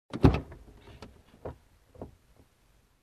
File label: Opening Car Door